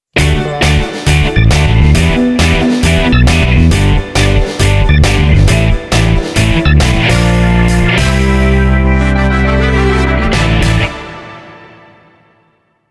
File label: Happy Point